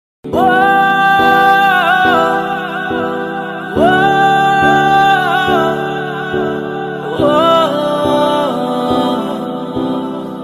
heart touching background music sad background music; ncs